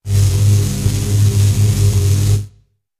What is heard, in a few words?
Electrical Field, zaps